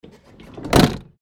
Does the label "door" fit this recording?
Yes